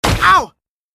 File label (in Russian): udar; Viking Way